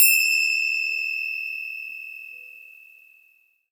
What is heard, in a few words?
FINGER CYMB, Percussion; ILIO; Synclavier Percussion 2; World & Orchestral